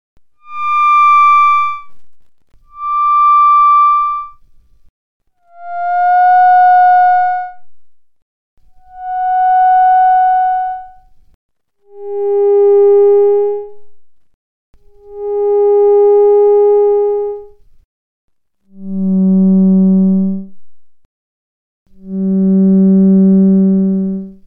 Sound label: melodia vs d-lev